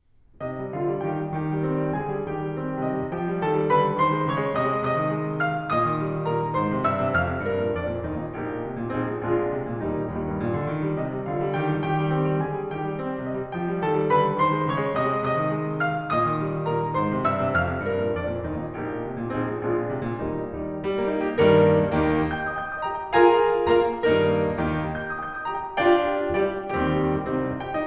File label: music